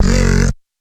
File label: F# Groan, Dubstep Bass Hits; Oneshots; Serious Dubstep